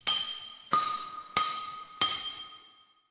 hammer

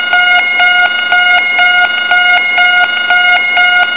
car alarm

